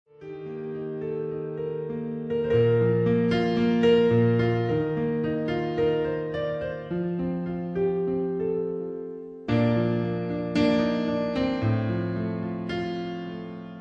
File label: ACCOMP